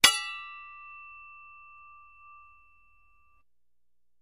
Axe Clashes With Ring Outs; Light Axe Clink With Scrape And Light Ring Out. - Metal Scrape